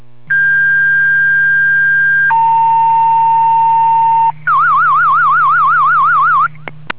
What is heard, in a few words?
Fire Dispatch Tones